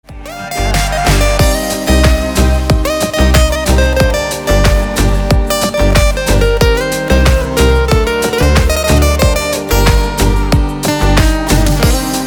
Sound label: call sound